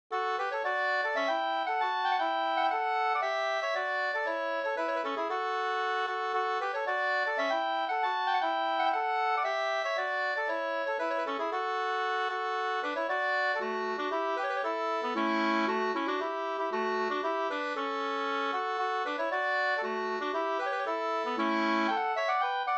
polska Bodapolska

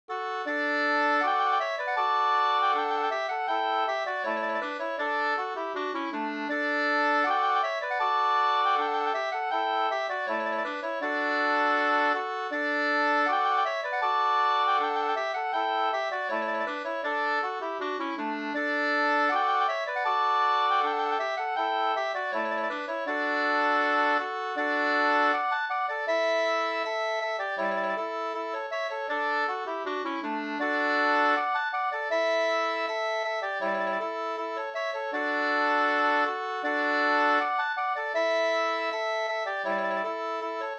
ganglat

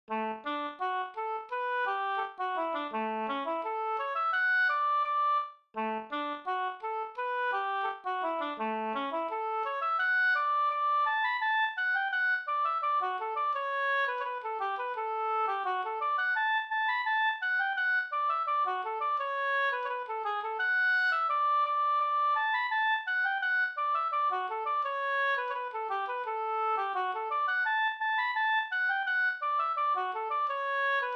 schottis 4 4; Pater Katt e Lasse i Svarven

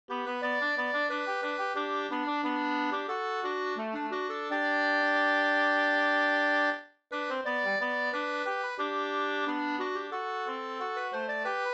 folkdans Kadrilj; fr Sorbyn Nb 2st V1

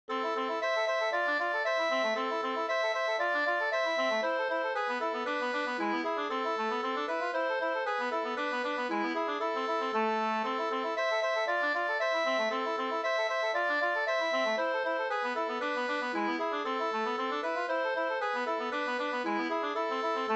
langdans ringdans

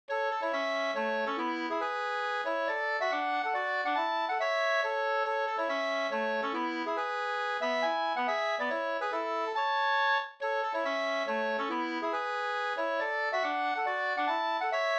hambopolska

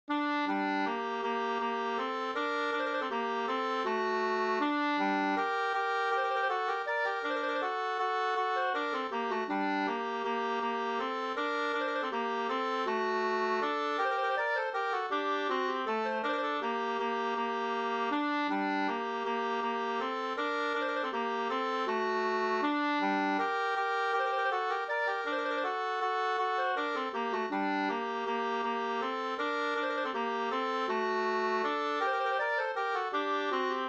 vals g moll

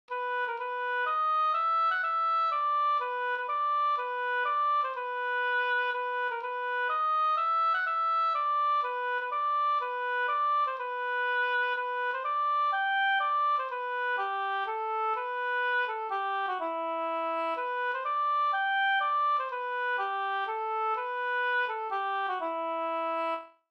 polska fr Lappland

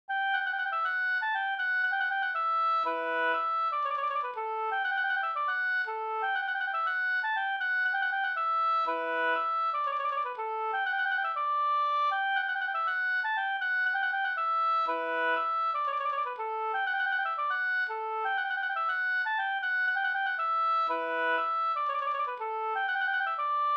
polska Bodapolska

